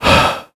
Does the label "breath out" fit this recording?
Yes